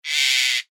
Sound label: parrot squawk